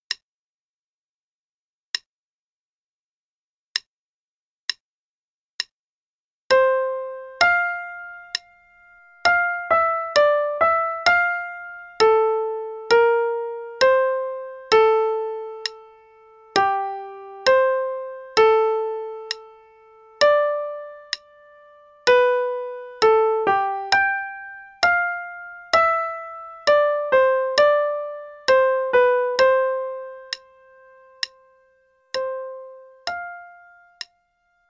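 Play-a-long, Air, right hand only, quarter note=66; Bach; BWV Anh 131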